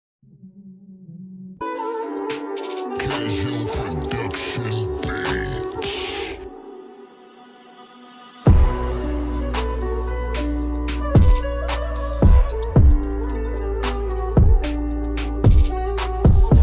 slowed reverb

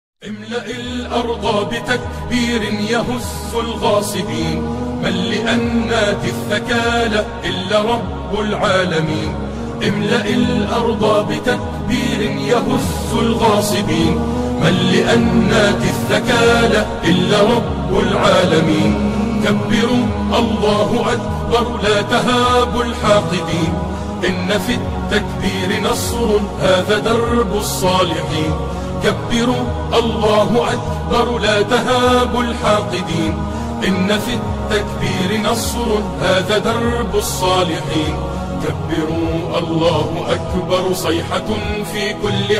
nasheed islamic; kebbiru allahu ekber

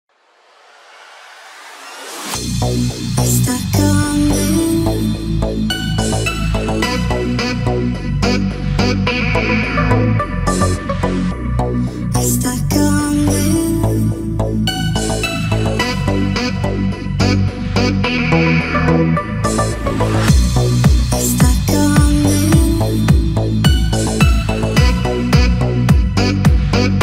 love emotion golden eurodance hit

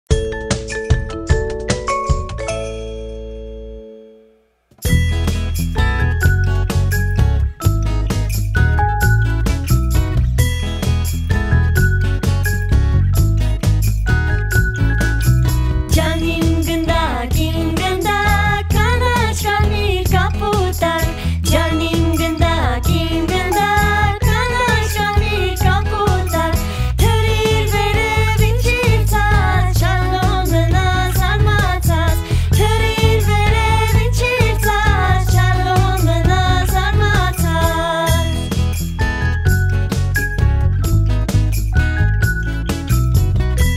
armenian children s songs; jan im gndak

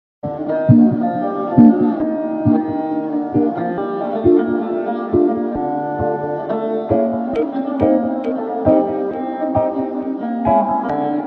instrumental

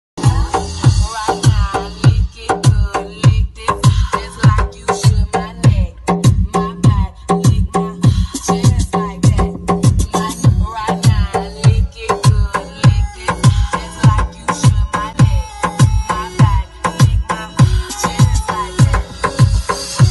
remix

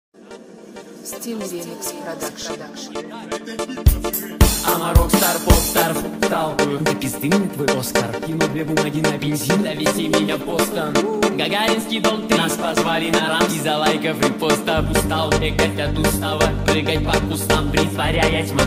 remix lezginka

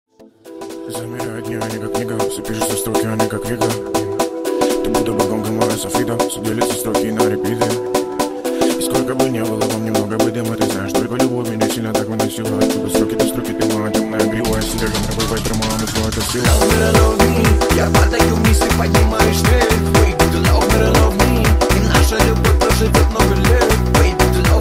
lezginka remix lezginka

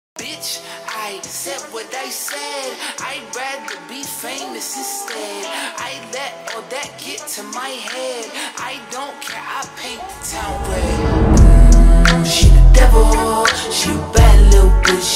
slowed reverb

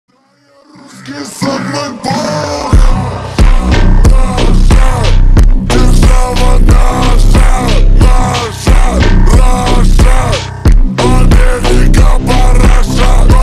phonk slowed

